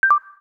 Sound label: cancel, Samsung phone system; SGH-T369 Linx; Paragon UX